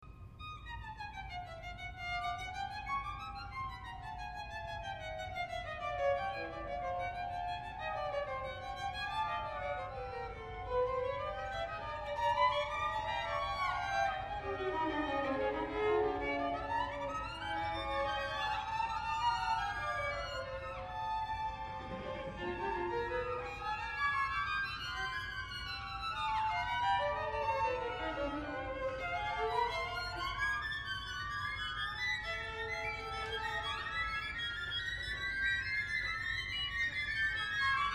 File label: string quartet; auerbach brown